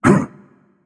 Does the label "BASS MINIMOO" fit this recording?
No